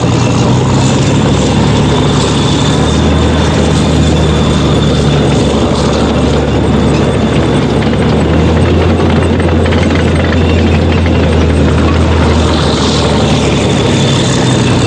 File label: tank move; mrk tank 03 move